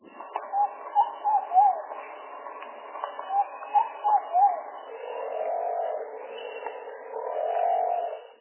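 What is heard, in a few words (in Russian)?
звук пения голубиных песен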